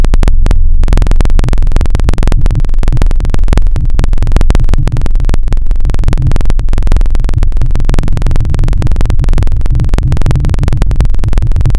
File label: Moombahton; Synthetic's Serum Collection